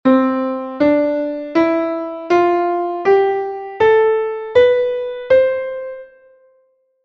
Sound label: scale of c; Essentials of Music Theory: Elementary